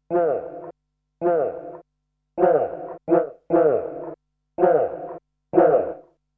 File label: dog bark